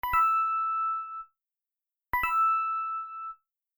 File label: Coin; mario